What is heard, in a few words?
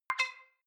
click whistle up, signale